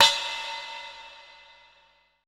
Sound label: E TIBET; Best Service Advanced Media Trax Modern Composer; ETH TIBET